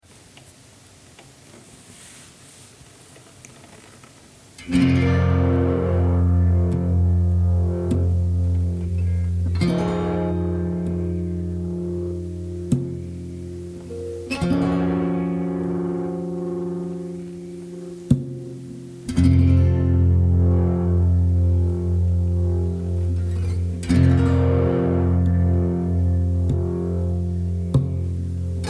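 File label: F 100 guitar chords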